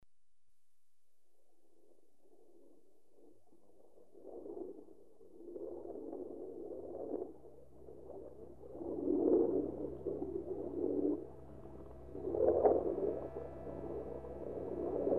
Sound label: German U-Boat, torpedo passes left to right; The BBC Sound Effects Library